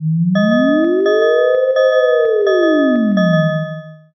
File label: firetruck